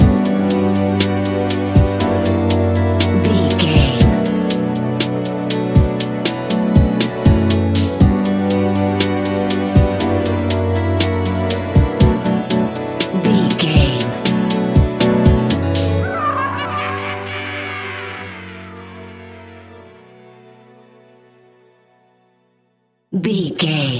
graveyard chill, A relaxed and chilling piece of music